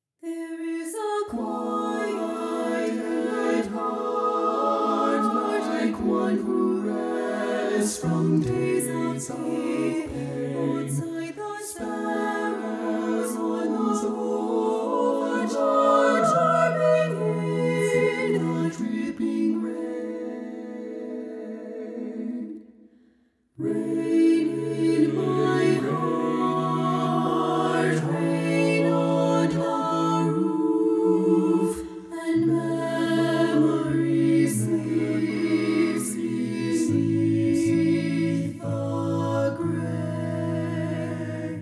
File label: Alto II Left